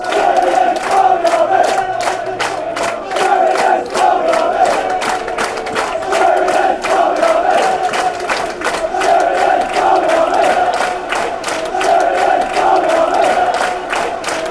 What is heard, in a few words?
barmy army, fanchants; sheridans